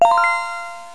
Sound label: chat